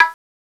TABLA, FAIRLIGHT CMI IIX; PERCUSN2

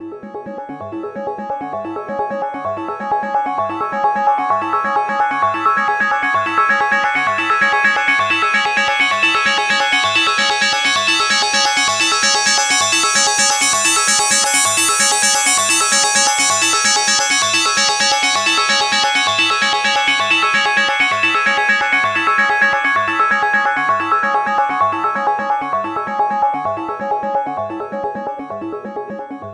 NRG Sweep, Trance

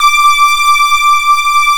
BASS D6

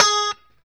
POP G#4, STRAT guitar; Spectrasonics; Hans Zimmer Guitars VOL-1; JC-STRAT POP

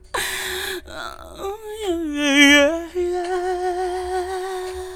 FEM, Hip Hop; E-MU Formula 4000 Series Vol. 1; Hip Hop Nation; Phatt Phemmes 2

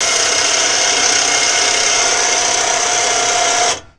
HYDRAULIC, Industrial; SFX

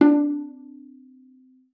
D4, VIOLA PIZZ; Xsample VOL-5; 90 s Sample CDs

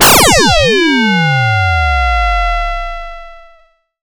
SYNTH FX

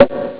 roll; Amiga Soundtracker Sample Packs

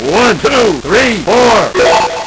Nintendo Gameboy LSDJ

